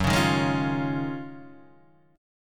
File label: D sharp Minor 11th F sharp, chord